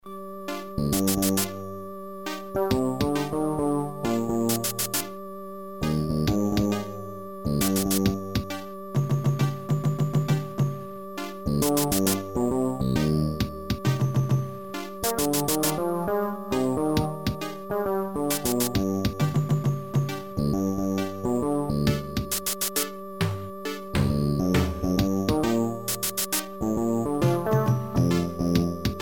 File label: Forrest loop Amiga; monkey island 1